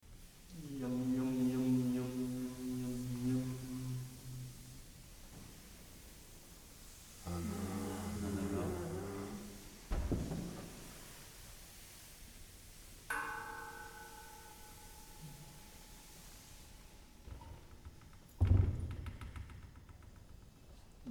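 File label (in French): bruit de fond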